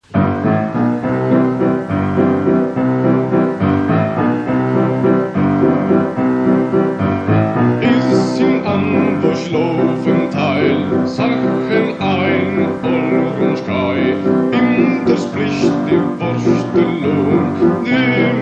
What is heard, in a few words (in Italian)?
Valzer; Trevi Sud Tiroler